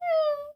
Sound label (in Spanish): Perrito se lamenta